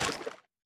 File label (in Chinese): 脚步声; 炽足兽